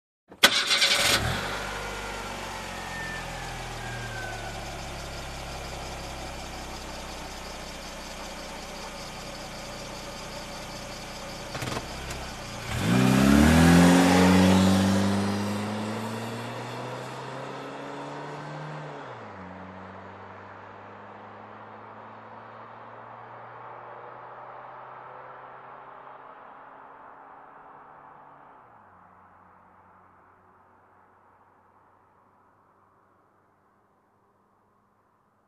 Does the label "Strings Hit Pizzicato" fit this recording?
No